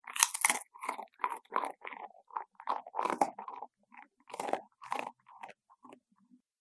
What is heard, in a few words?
Dog Eating Biscuit; animal; Dog Sound Effects